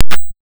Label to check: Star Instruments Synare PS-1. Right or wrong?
Right